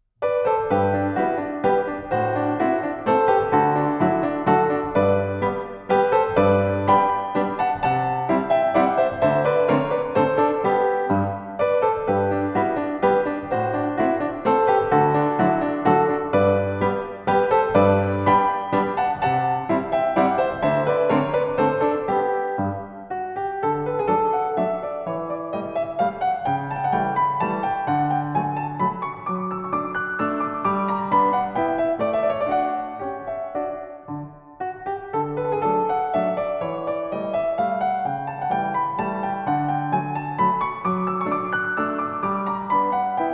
Valzer pianoforte; von M.J. Leisendorf

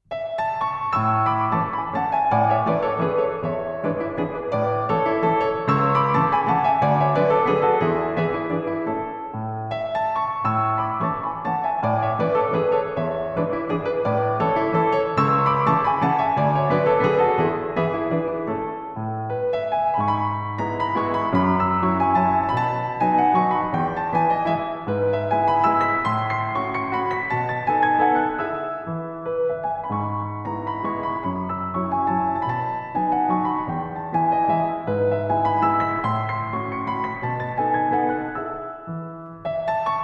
Valzer pianoforte; von Ferdinand Stegmeyer

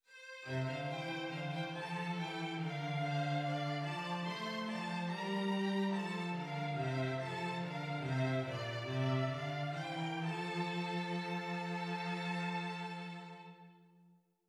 esercizi di doppio contrappunto nella decima a Tre; Ronge pagina 183

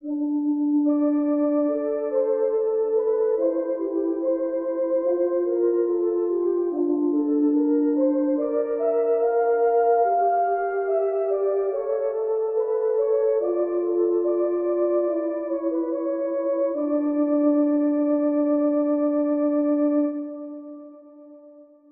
Esercizi di contrappunto a due voci

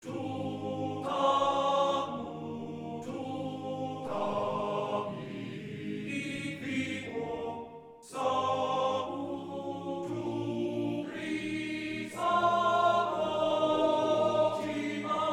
per coro o quattro voci soliste; O Salutaris hostia

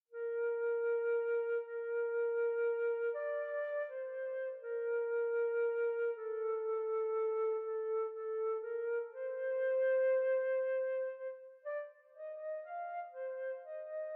Trio allegro moderato; Beethoven Ludwig van; Esempi musicali

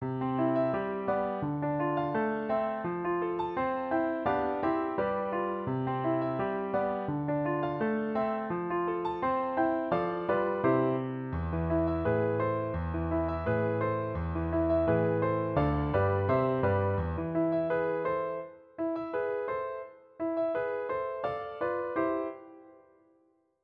Frammento in do maggiore per pianoforte; abbozzo originale

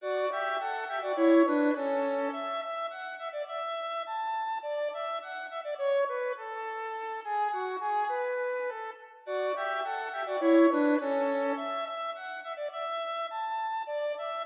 Pastorella; Beethoven Ludwig van; Skizzenbuch pag. 67

